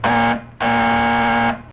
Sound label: Buzzer